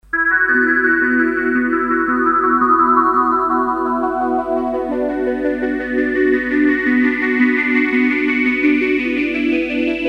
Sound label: RS370, Polyphonic harmonic generator, Using the on board arpeggiator, an FB3 Filterbank and RS390 echo